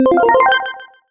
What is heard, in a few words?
craft rarity; Brawl Stars